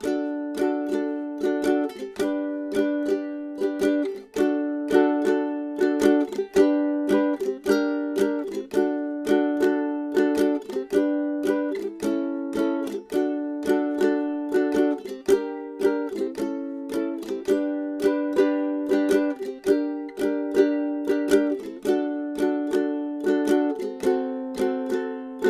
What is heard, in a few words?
solo